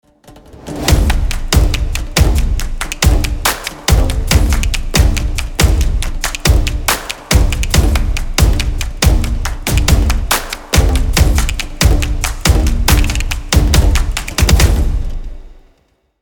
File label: Energy Stomp